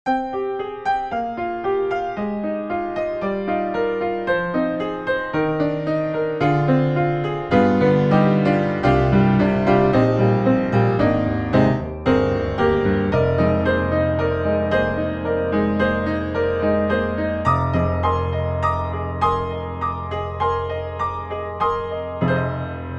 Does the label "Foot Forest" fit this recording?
No